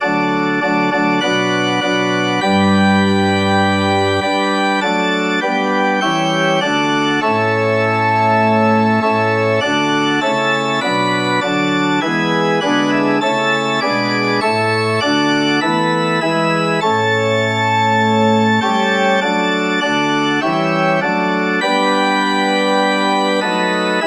organ

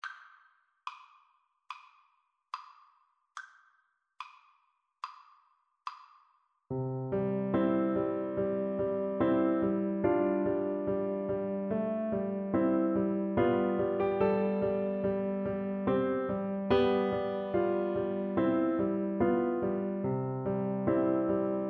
raindrop, Recorder

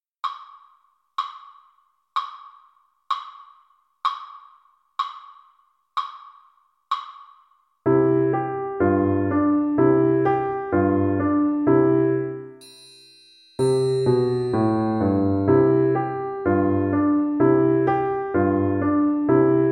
triangle, Percussion

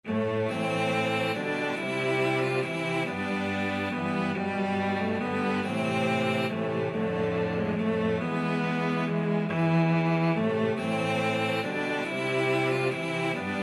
what child, cello quartet

